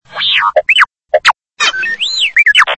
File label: r2d2 lol